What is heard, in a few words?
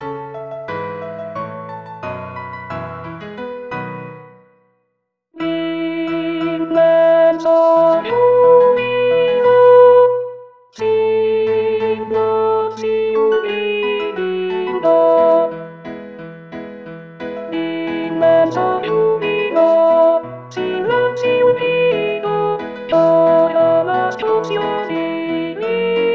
alt d'immenso giubilo satb